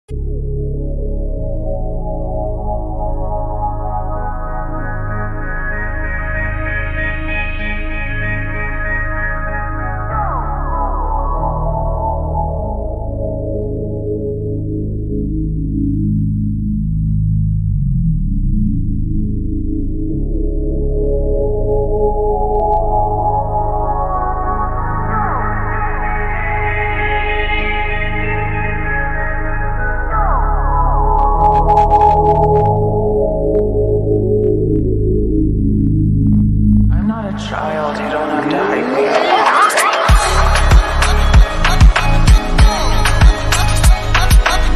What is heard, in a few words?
instrumental slowed